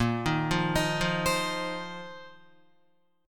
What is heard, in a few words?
A sharp Suspended 2nd Suspended 4th A sharp, Guitar; 5,6,5,8,5,8; 4F,4A,5C,5G,5C,6E; arpeggiated